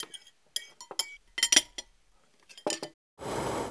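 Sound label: food; jidlo; Mafie 1 cz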